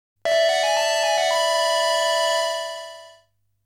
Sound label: Success